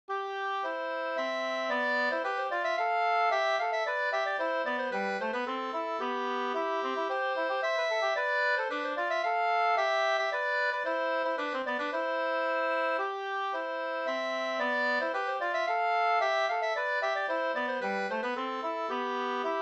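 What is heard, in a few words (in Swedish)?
polska, tvåstämmig; Tarnsjo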